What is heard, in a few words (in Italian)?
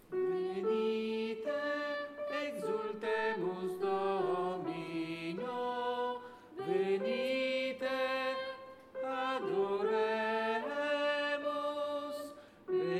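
Venite Exultemus Domino, tenori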